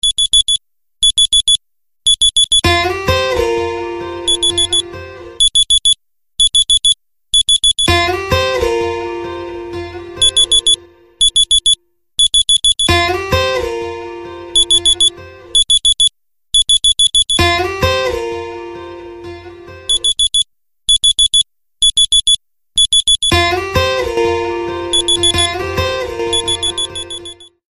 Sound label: Alarm Buzzer; Experia